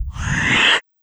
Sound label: Sci fi whoosh